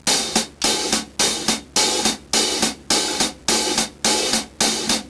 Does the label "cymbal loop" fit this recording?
Yes